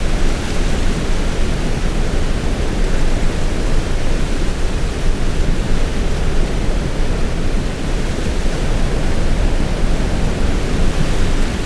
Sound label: rain loop; War Thunder